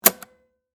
Cassette Press, Technology, Tape, Cassette, Press, Stop, Tape, Panasonic, Handheld, Recorder; Tape Player; SoundEffects-BlastWave